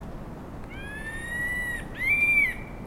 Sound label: laughingthrush assam Trochalopteron chrysopterum woodi